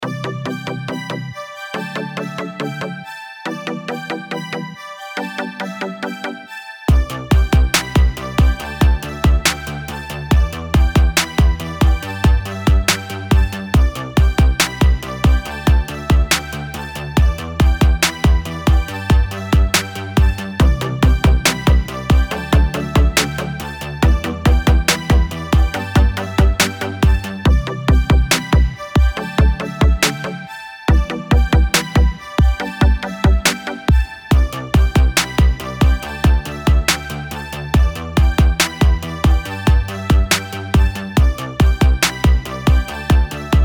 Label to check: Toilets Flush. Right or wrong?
Wrong